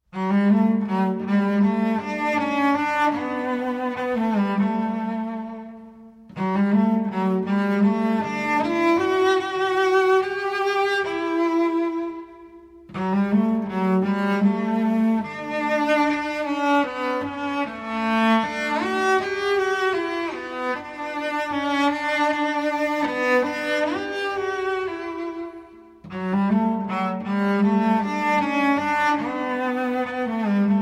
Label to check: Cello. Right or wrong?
Right